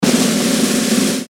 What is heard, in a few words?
snare roll